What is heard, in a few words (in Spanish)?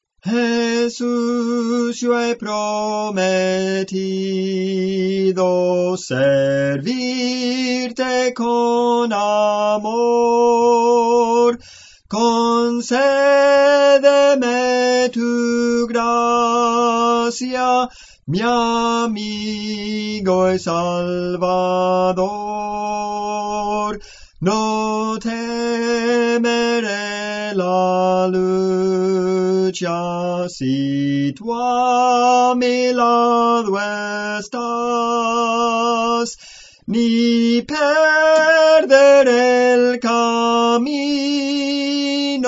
Soprano, Cantos Espirituales; Soprano (melodía)